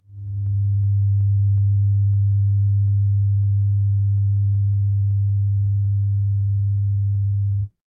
fire electric hum

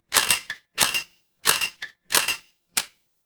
office camera

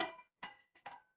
glass ceramic

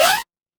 retro game jump

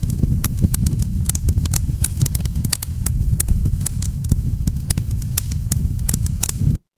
fire electric crackle

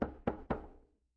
impact wood knock

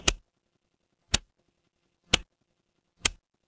mechanical switch

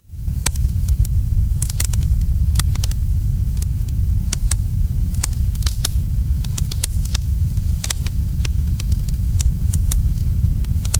ambience fireplace

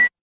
retro game coin

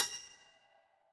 impact anvil

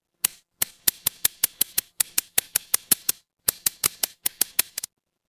office pen

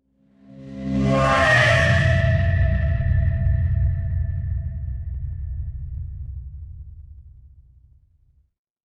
horror stinger

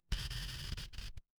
retro game coin

